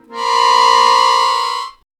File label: quick accordion blast